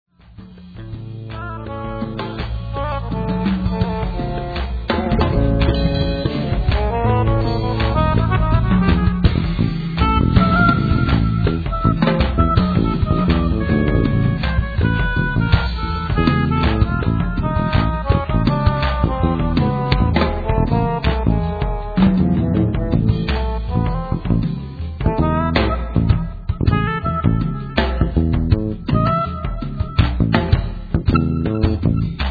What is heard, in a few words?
trance